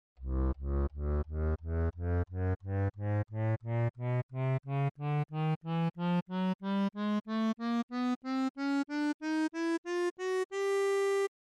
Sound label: one sample scale